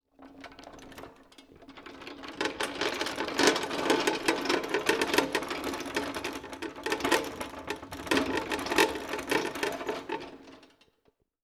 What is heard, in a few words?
Metal Trolly; 99Sounds; The Warehouse